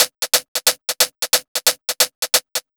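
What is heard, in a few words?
drums, Pure Electro; USB Soundscan vol.03; AKAI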